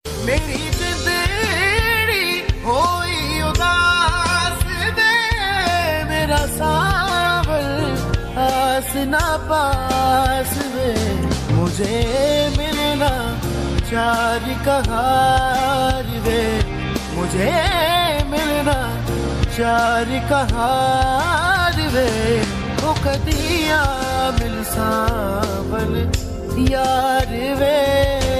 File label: Ost pakistani ringtone